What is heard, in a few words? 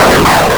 Explosion Large; arcade-canvas; ninja-runner